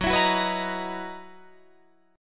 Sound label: F sharp 13th F sharp Guitar Drop D